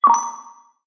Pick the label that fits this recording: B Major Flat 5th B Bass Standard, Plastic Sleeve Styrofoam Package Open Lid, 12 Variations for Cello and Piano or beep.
beep